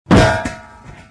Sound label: pipe land; movers objects